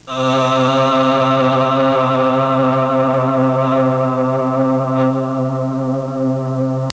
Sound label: synthesizer voice; Sound Effects for Multimedia - Volume 1; electronic sounds